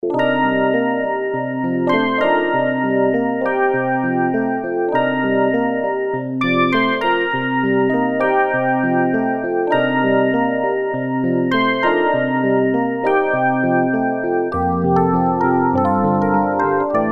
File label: Digipiano; FMagia; dinopark